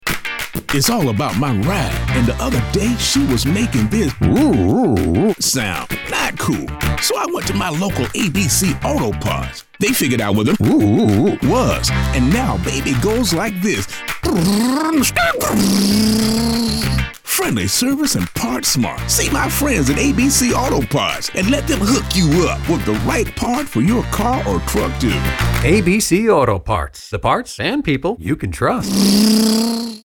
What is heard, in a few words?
I Love My Car Hip Hop; ABC AutoParts